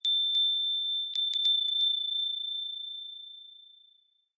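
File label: wind chime; furin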